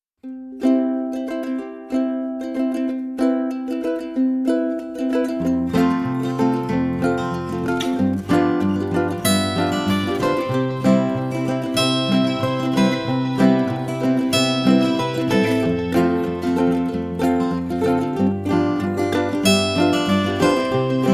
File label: Romance Instrumental